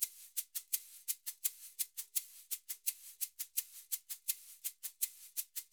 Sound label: SF LOOPS 84, Percussionworks; Sampleheads; NYC Percussionworks